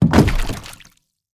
wooden boat sway

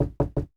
door knock quite

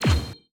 ui countdown

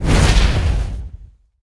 atk flamethrower

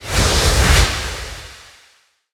fog blow away

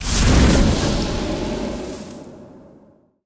magic screen

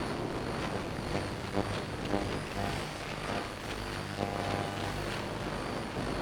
radio disturb loop

